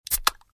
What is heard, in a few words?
carbonated drink, sizzle